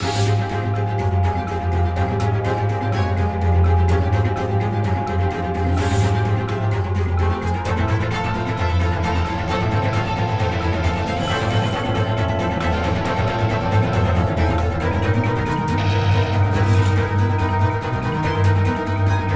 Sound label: Chase; the walking dead michonne